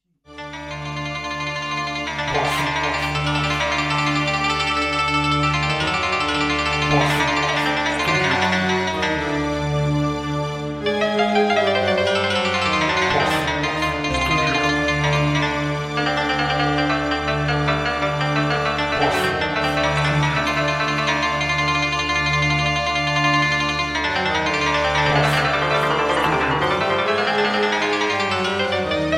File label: BASSU ARAB STYLE